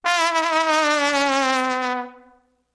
Trombone Gliss Down With Vibrato

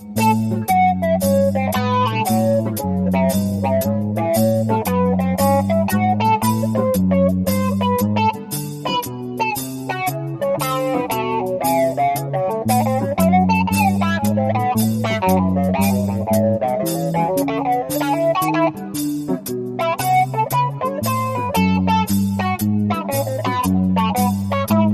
Sweep Blues

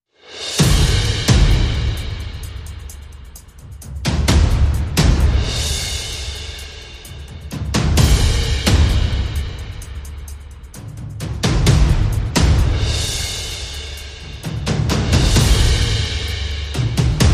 Perc and FX

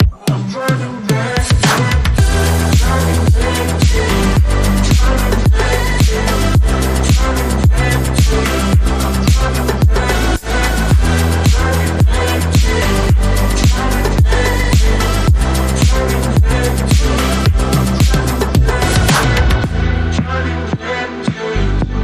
Electric Disco

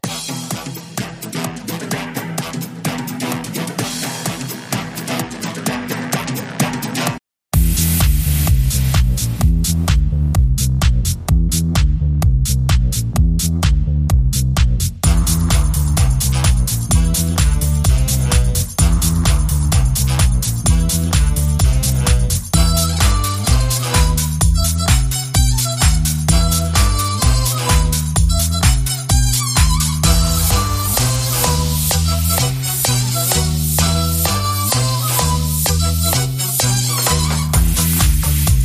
Swing, Dance Remix

